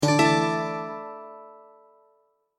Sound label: notification